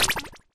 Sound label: COLLECT COLOR; Dino Shift 2